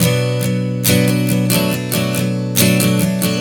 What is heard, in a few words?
Strum 070 Bm, One Chord Strumming 070 bpm; Acoustic Guitar; Sonic Foundry (Sony Creative Software); Songwriter Acoustic Guitar Companion